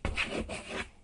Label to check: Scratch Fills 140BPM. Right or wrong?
Wrong